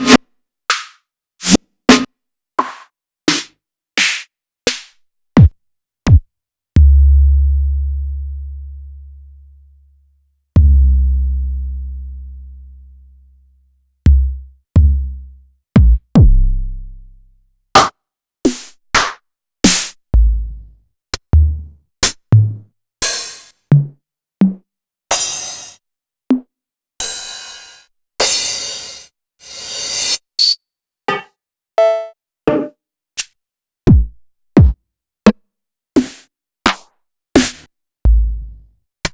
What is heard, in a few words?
Roland SH-32 Drumset